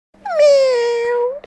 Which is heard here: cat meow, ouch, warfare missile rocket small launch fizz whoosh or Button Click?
cat meow